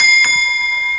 gas station

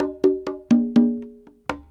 PERC LOOP ETHNO 125

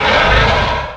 Launch; Net Power 2000 #2; WoTdemo; Angreal

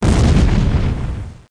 expl; SpaceOrb

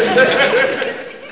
laugh

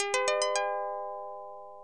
boot down; SGI O2 Out of Box Experience 2.2; systour

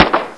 gun

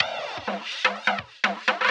PERCLOOP HOUSE 125; eJay

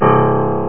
Piano Low C

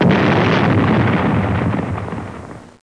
explos; AB3DII

